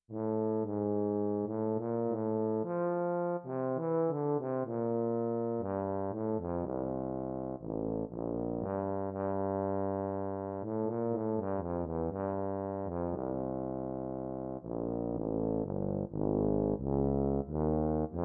Tuba Lyrical

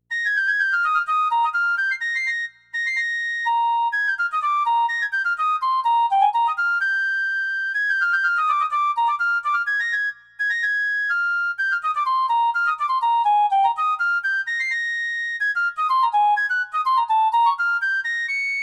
Piccolo Technical

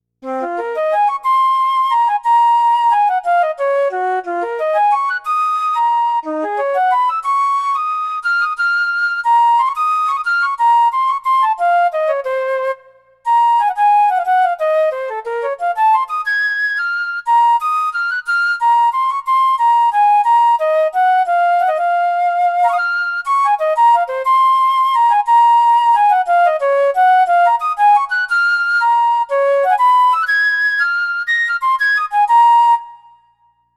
Flute Technical

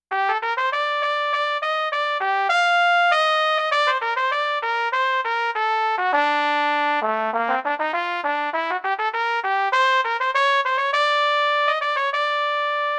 Trumpet Technical

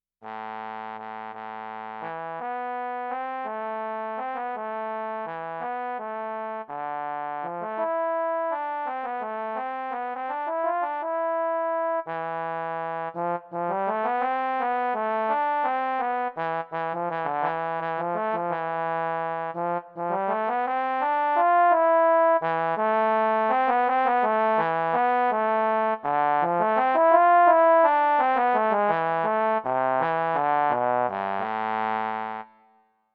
Euphonium Lyrical

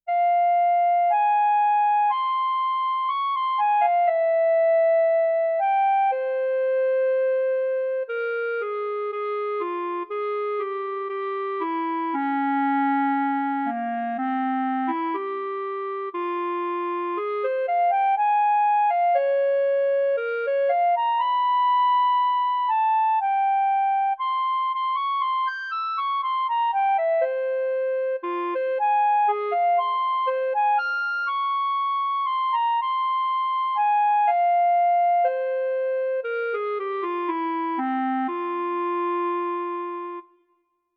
Eb Clarinet Lyrical

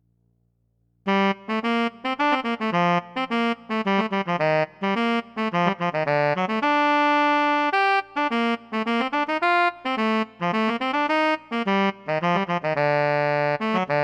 Tenor Saxophone Technical

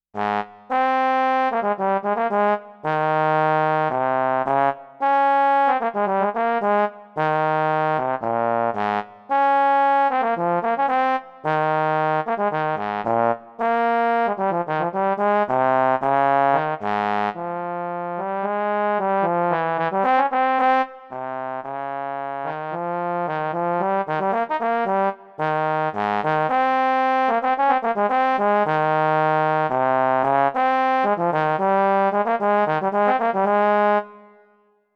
Trombone Technical

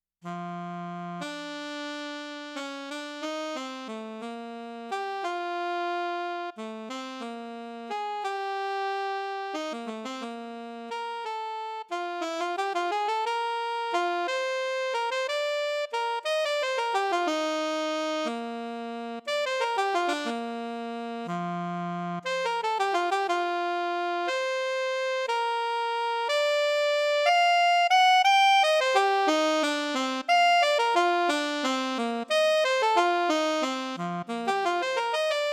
Alto Saxophone Lyrical

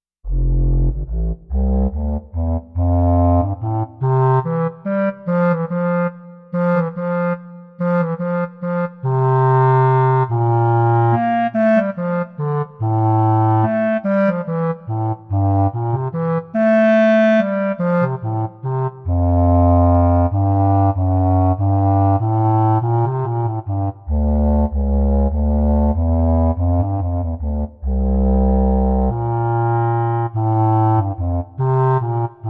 Contra Clarinet Technical

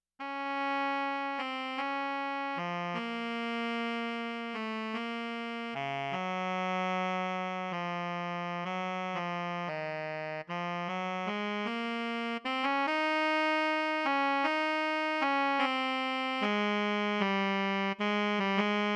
Tenor Saxophone Lyrical

